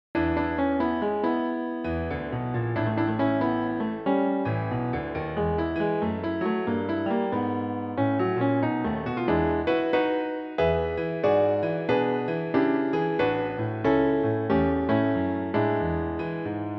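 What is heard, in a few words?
piano, Blues für Klavier; mit Vorspiel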